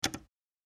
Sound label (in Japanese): スイッチ